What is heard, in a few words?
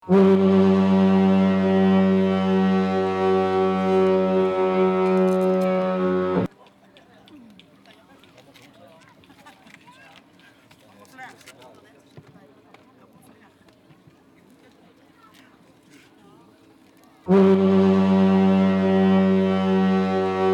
fog horn sound lindesnes lighthouse